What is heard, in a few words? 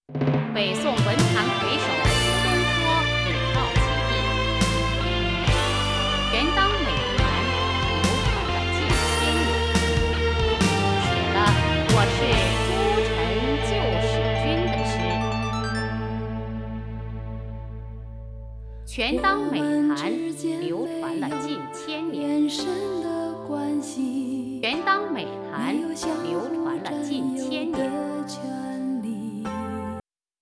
stereo 22050hz